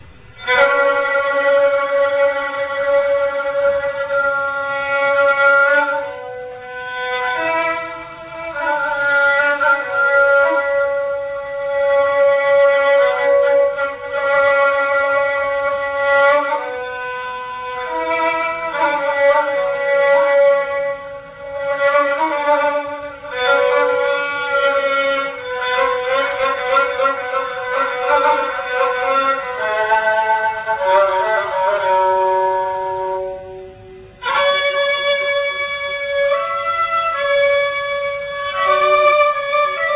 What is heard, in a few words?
gheychak